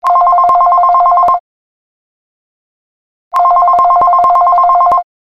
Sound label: Office; Wall Street